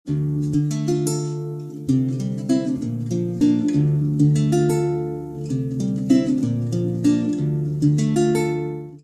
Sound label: lute